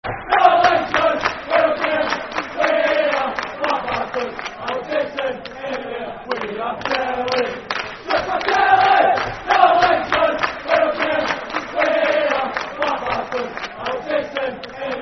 Football Chant (Soccer Song); We Are Killie; Kilmarnock Kfc

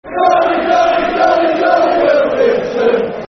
Ohh Jonny Jonny, Football Chant; Yeovil Town Ytfc; fanchants

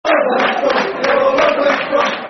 We All Love West Brom, Football Chants; West Bromwich Albion Wba; fanchants

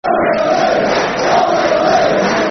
Barmy Army Ipswich, Football Chants; fanchants; Ipswich Town Itfc

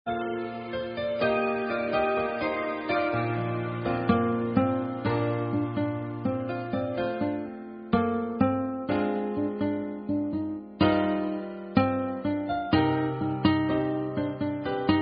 Karaoke Songs; Girl Scouts

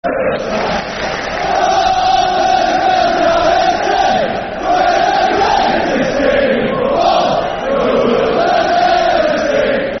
Huddersfield Town Fc, Football Chant; Soccer Songs; fanchants; Huddersfield Town Htfc

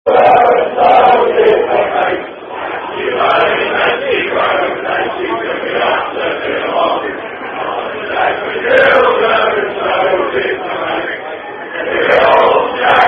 Football Chant; We All Shagged Matilda

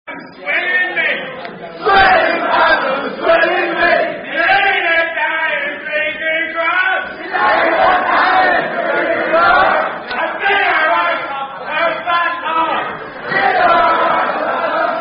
Song; Buster's Song Leighton Buzzard; Piss Pot Pete (Tune Sound Off)

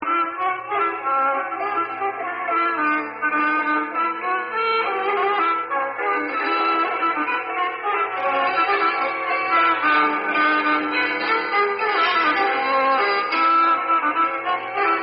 Old Maids Waltz; Barnes

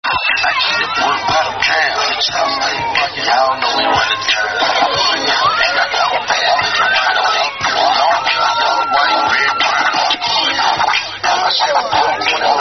Sorority Chant; Serious Matter; Alpha Kappa Alpha AKA Sorority Chant

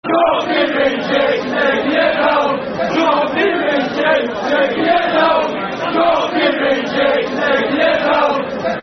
Football Chant (Soccer Songs); Preston North End Pne

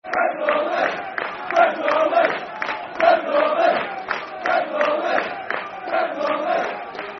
Brentford Army football chant (soccer song)

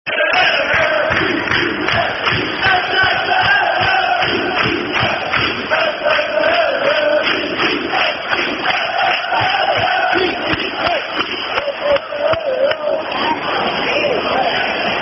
Football Chant (Soccer Song), Peterborough; Pufc; Peterborough United

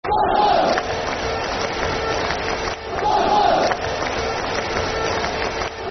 Blackpool (Clap) [Football Chant (Soccer Song)]

